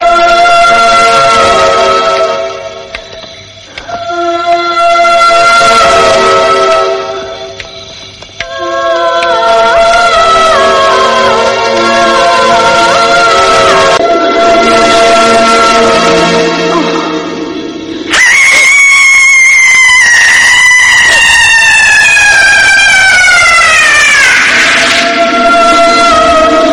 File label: Horror Danger Sound